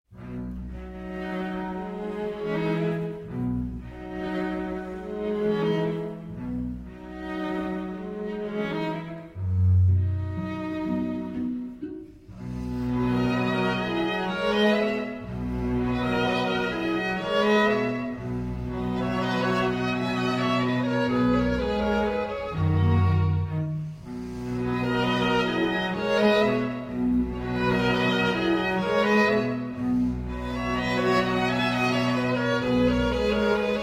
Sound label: Flower Duet (Arr. For Violin and Strings; Lakmé